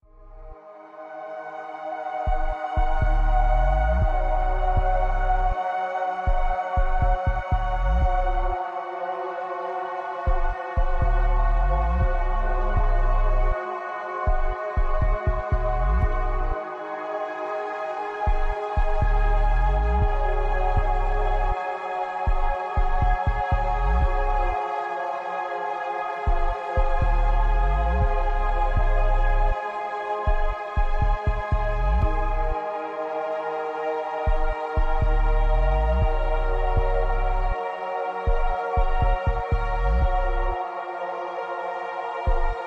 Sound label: Scary track for short film; Beatoven ai